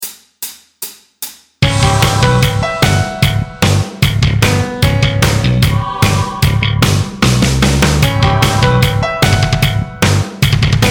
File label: Locrian mode backing track